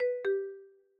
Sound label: call end; nheko